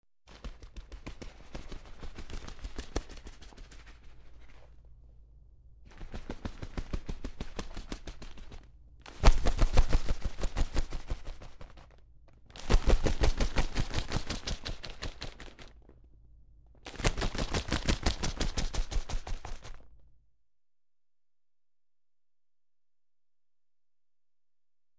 Bird flapping wings

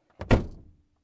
Closing Car Door

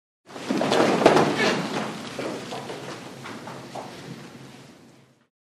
People sitting down in court

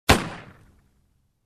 Single pistol shot

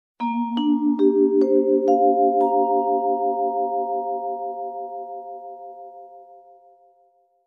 Jazzy vibraphone scale up

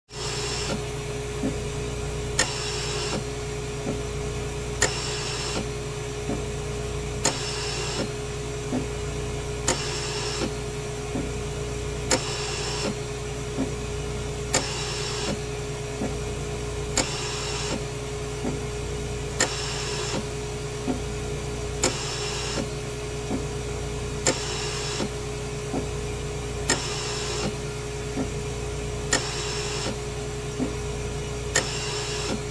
Medical Device closing and opening valves

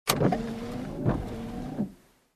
car windshield wiper on

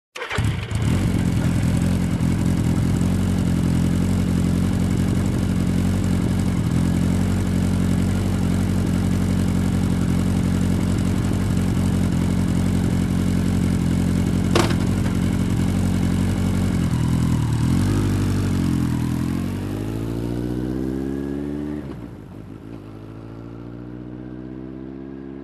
Motorcycle engine ignition and drive away

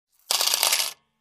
Few coins put in cash register

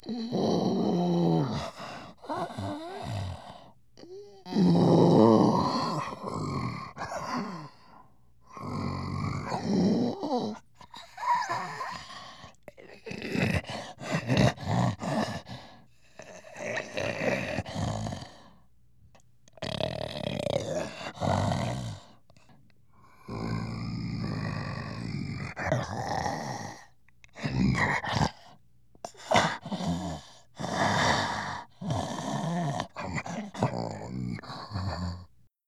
Gorilla Breaths

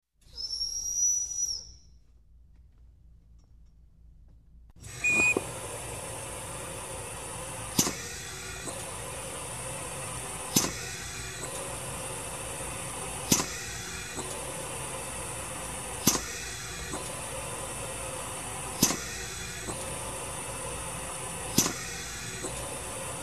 Air pumping and releasing

